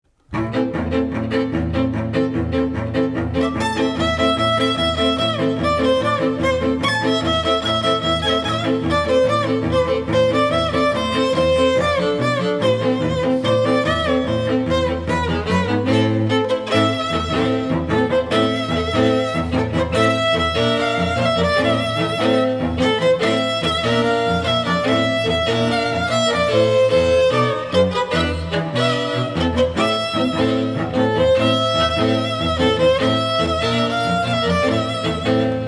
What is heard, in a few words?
Hanukah Song (String Trio); holiday